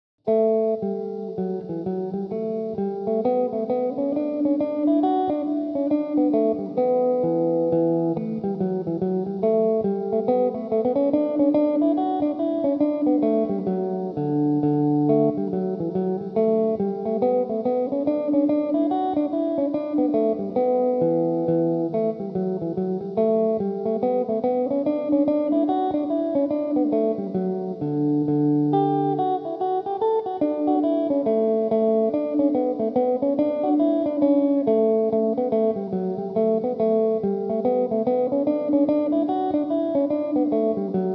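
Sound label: hornpipe D major, mandolin; The Heather Glen; Belmuse EM-190 electric mandolin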